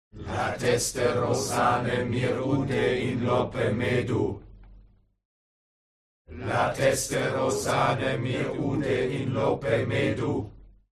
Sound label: HUMAN CROWD Evoke Normal; smartsound